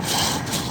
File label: bloodsucker breath